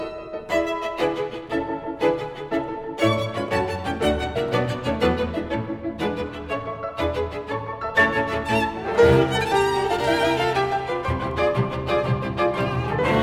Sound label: Piano Quintet in A Major Scherzo Presto Live